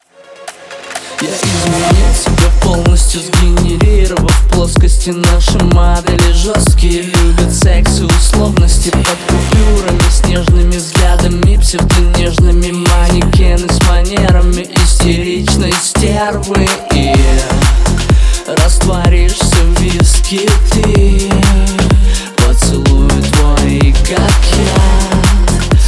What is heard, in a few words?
sax